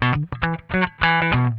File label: SWING IT, Funk Guitar; Zero G - Funk Guitar